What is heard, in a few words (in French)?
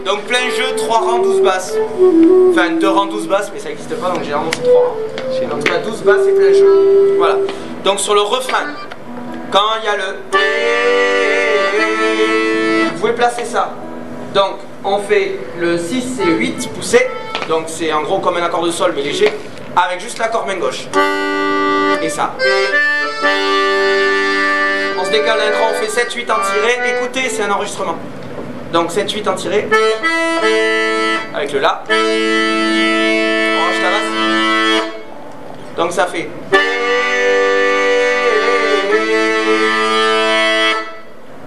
Accompagnement diato; Ederlezi